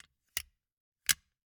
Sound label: Gumball machine, candy door, plastic, open, shut, hyper; Eiravaein Works; Vaeyan IV